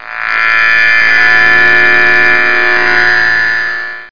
electro

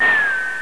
Sea Die; Empire Master 2.6 Demo

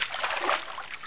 paddle

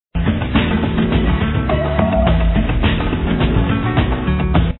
brazillian; maya miguel global groovin

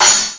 cymbal crash hi; Amiga Special - Software Hits; orchestra; drum

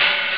tr909 bom, drums misc; OctaMED SoundStudio V1

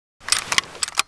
rifle forward, weapons foley; Spearhead Demo; MacFormat 2003 June

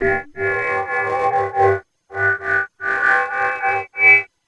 radio; SWH LIFE

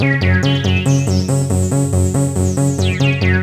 fade in, 140bpm, keyboard; hous-tec

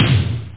Tom; MarcDrive

